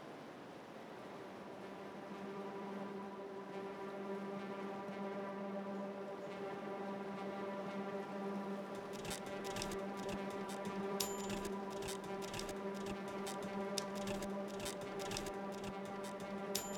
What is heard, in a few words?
RepeaterGuitar sketch delayed drums fade to drums; mcsm music; Netflix